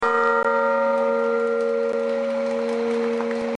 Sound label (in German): Klangprobe Taufglocke